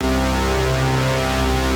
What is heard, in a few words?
Laser Boss (Loop); Neon Souls; PS4 PS5 switch windows xbox one xbox series xs